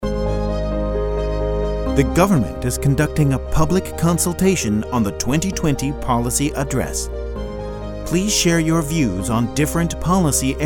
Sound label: Music